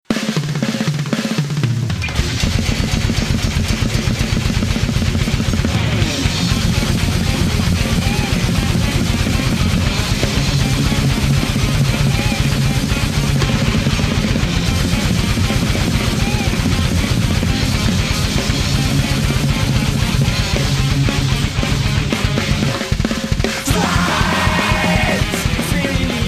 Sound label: Manic Ringtone